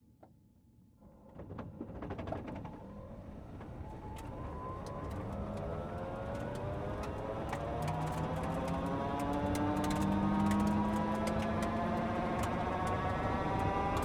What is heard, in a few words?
Ford Puma WRC - ONBRD - RAMPS - EV - Start Drive Stop x3 - ENGINE; Pole Position